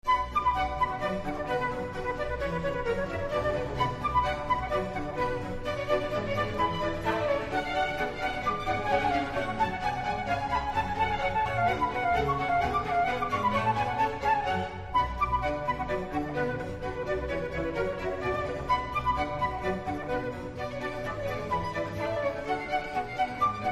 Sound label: Orchestral suite in B minor Badinerie; BWV1067